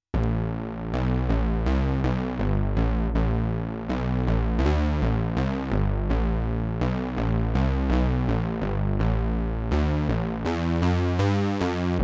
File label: Dirty Bass Lead, Poly patches; korg mono poly